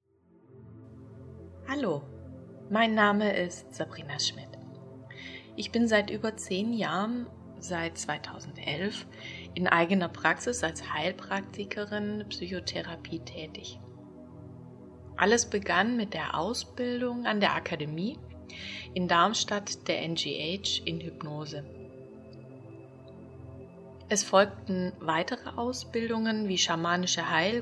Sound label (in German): Intro mit Musik